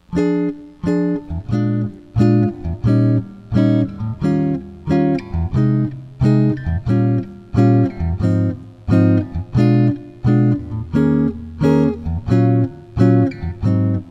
Advanced Comp; jazz tunes

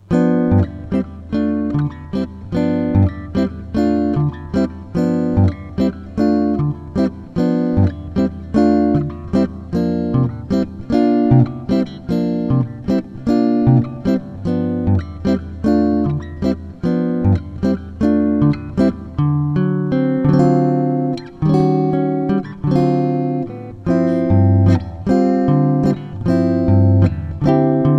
Comp, jazz tunes

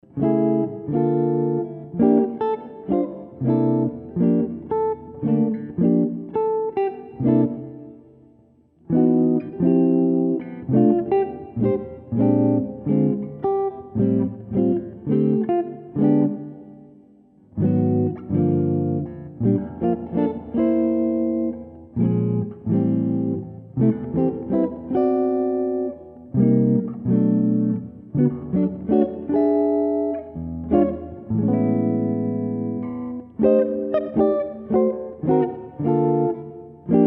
jazz tunes

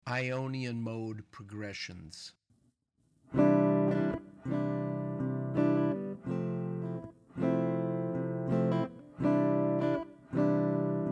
Modal Chord Progs Ionian; lead skills materials

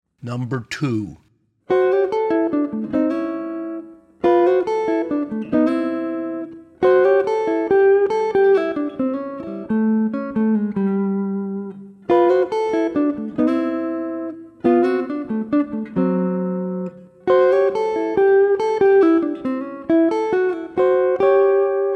Blues Jazz Solo Concepts; lead skills materials

